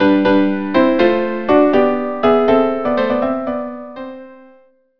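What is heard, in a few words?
viola duo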